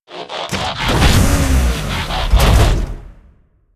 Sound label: ambient port; World Of Warships; port3d